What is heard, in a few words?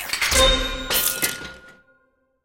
place Scatter In Mechanism; lost relics